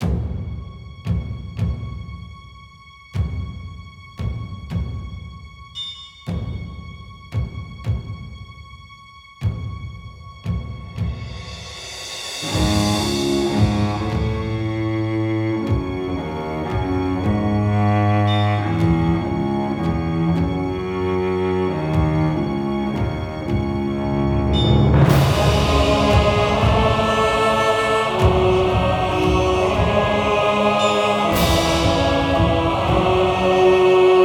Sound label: dark lands